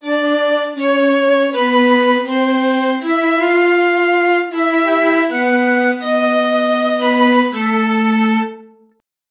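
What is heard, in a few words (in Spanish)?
música de game over, cello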